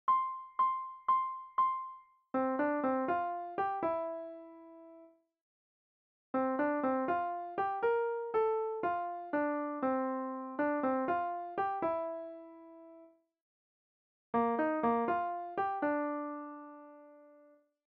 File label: Guitar Line (F)